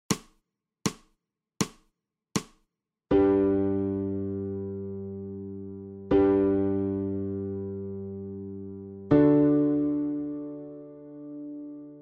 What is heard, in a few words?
SOPRANO